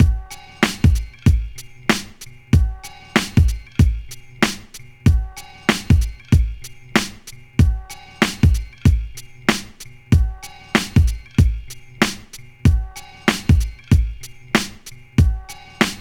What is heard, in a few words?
Menu Loop; NBA Street; GC; PS2